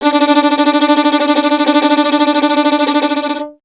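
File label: string violin tremolo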